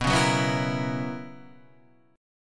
B Major 7th Suspended 2nd Suspended 4th, down, Guitar, Half Step Down